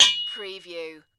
Anvil Hit Strike; sound effects bible

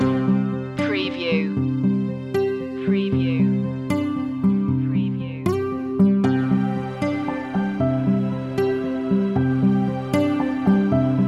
acoustic loop

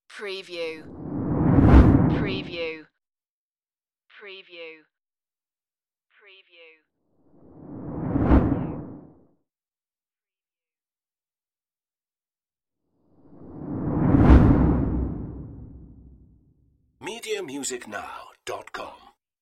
whooshes

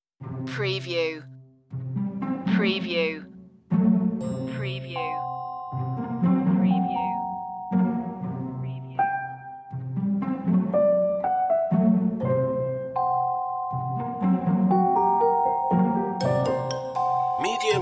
no marimba

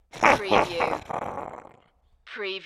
Evil Chuckle

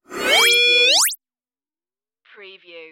tonal transmission interference; AM / FM tuning, static radio sounds

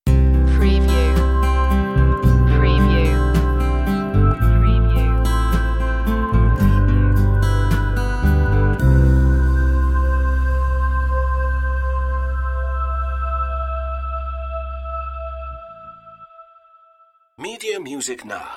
acoustic ocean stinger

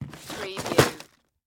Drawer Open Plastic; Sound Effects Bible

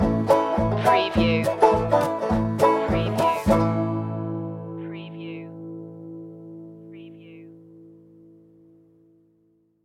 country stinger

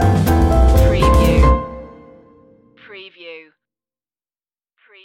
jingle bells jazz stinger

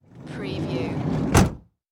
Van Side Door Close

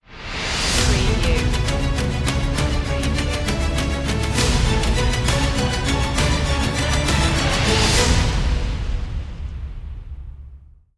immortals bumper

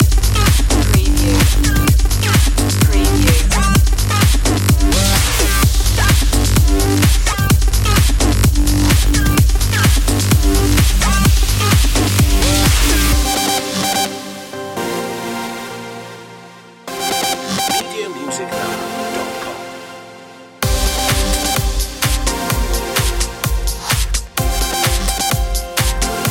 high drive

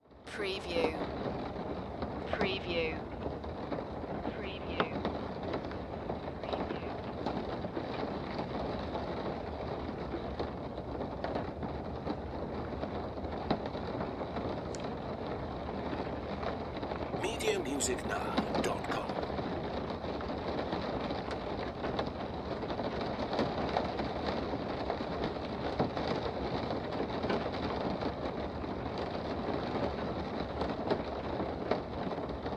Rain Car Window Int

